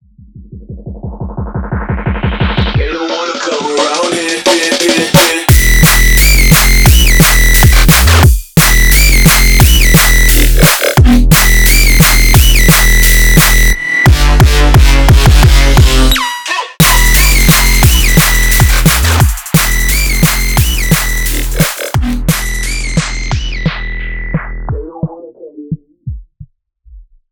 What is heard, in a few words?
Drum and Bass